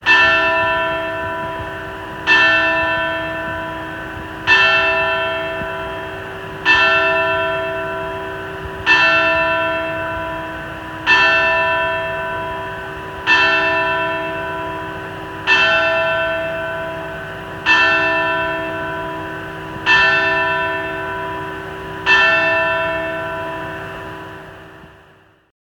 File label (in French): onze coups de cloche